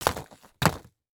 Sports Ball Golf Ball Dropping Into Golf Bag Pocket Double